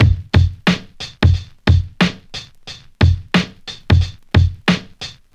90 bpm 00s drum loop f key, Free drum loop sample - kick tuned to the F note.